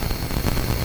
Elec Buzz Gas loop

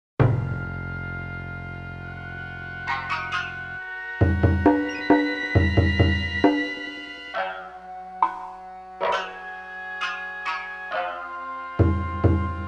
Destruction Dawn Victory; Sengoku Blade

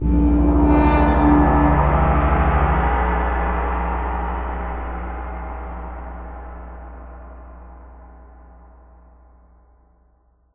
tension end

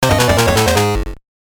Jingle; Glocal Hexcite; WonderSwan

